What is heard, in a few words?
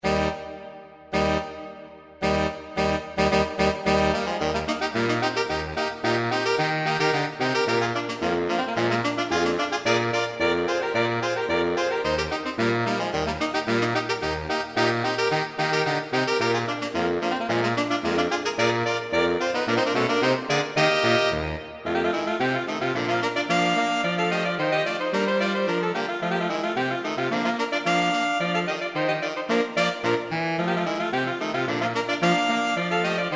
Clarinet Polka, AATB; Saxophone Quartet; Oktoberfest